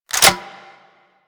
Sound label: Reload Cannon Insert; Grineer